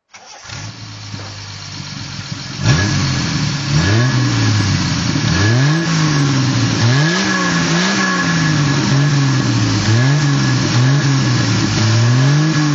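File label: tr3 throttle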